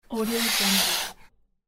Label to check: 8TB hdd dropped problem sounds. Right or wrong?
Wrong